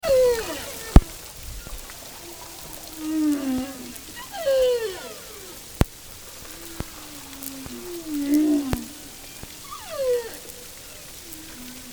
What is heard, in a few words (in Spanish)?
Canto ballena jorobada